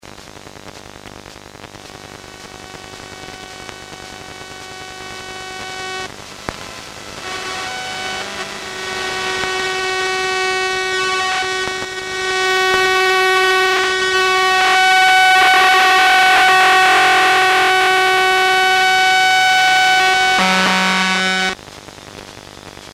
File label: subway arrival